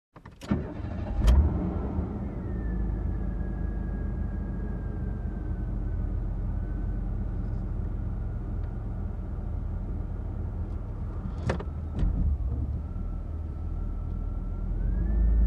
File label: Car Ride